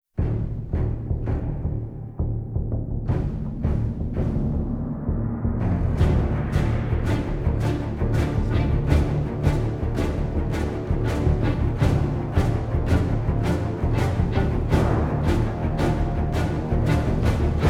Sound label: (No Choir), Orchestra